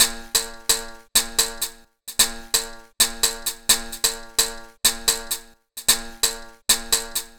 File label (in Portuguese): BEAT BERIMBAU